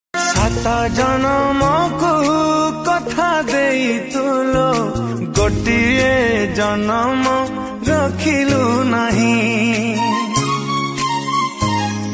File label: Odia Song Ringtone